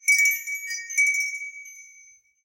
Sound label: Short Wind Chimes; SoundsCrate